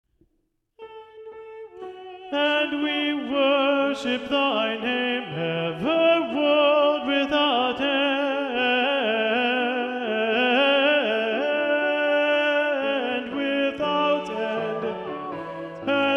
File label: And we worship Thy name Soprano Tenor; Handel; te deum